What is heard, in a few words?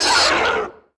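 MONSTER INSECT DAMAGE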